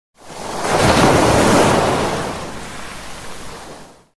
Ocean and Beach

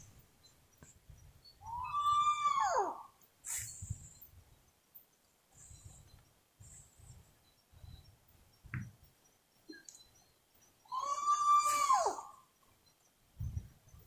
Spot bellied eagle owl

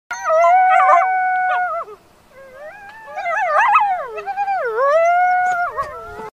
Coyote; زوزه; کایوتی

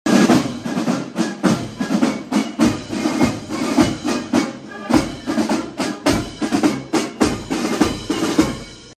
Revolutionary music

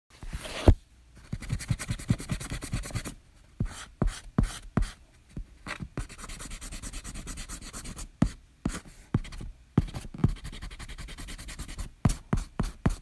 eraser; پاک کن

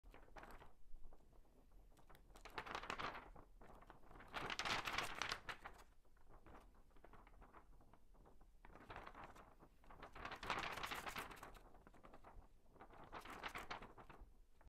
Flipping book in wind; ورق خوردن کاغذ کتاب در باد

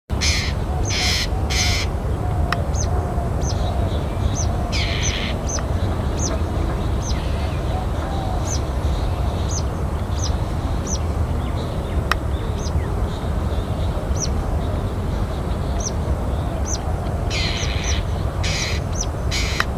Ashy drongo; بوچانگای خاکستری

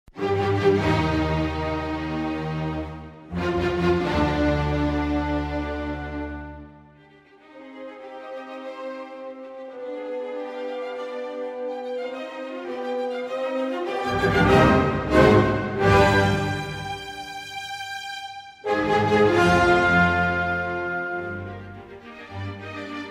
Baroque music

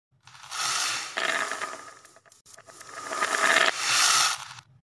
Marbles, قل خوردن تیله

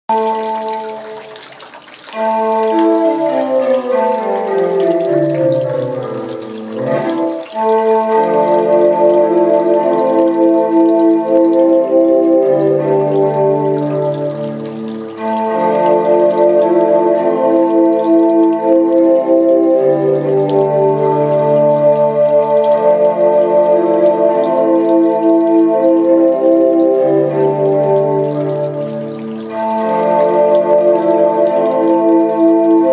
Hydraulophone

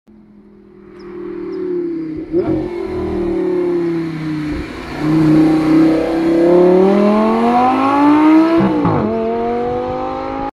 Lamborghini Gallardo

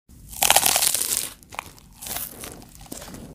eating lettuce

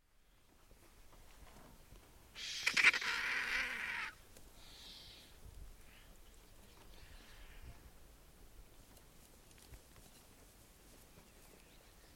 Indian vulture

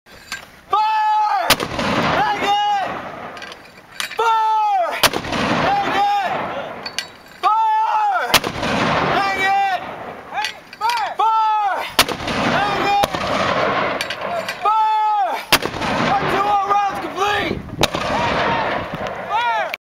Mortar 60; خمپاره ۶۰

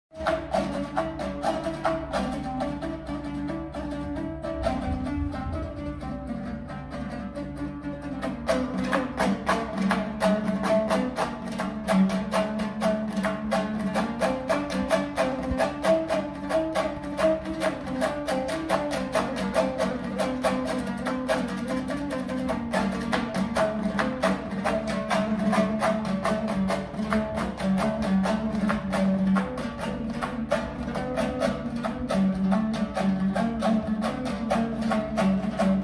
Komuz

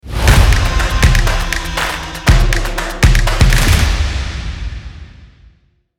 stomp logo

